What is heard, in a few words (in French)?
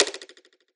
fleche